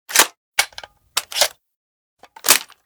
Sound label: Bren Reload; Modern Weapons Pack